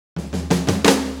4-4 Fill, Drum Loops

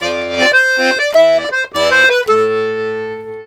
LARIDE, Accordions; USB Soundscan vol.40; AKAI

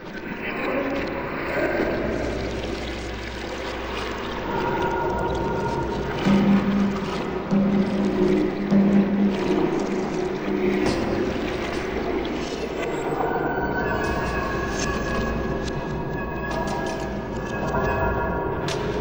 FX maelstrom; Sonic Foundry (Sony Creative Software); Ugly Remnants VOL-2; UR 2 FX (Long)

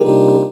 Chords Tones; Equipped Music; Loungin House

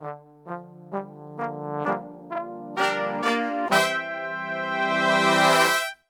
FANFARE, Brass; Best Service; Brass Super Section

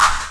CLAP; CLAPS; USB Soundscan vol.20; Fresh Disco House I; AKAI

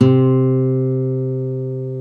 GTR Nylon, Nylon Martin; Club-50 - Foundations Roland

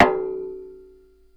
Radiator, contact mic, Metalics

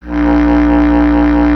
B. Clarinet

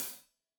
Hat Loose, Hi-Hat, Cymbals Stereo

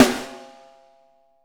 6X12 WOOD

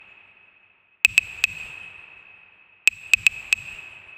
115 Perc Claves; Zero-G; Future Wave

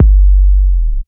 Sub Kicks, Bassdrums

